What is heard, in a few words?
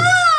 female orgasm